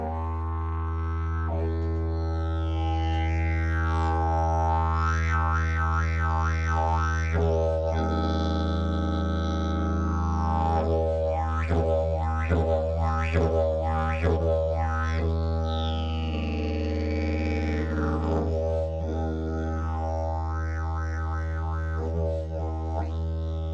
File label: didgeridoo; jilm rvac